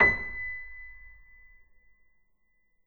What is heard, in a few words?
Grand Piano B5 p